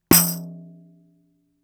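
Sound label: Tambourine; Soundeluxe UI95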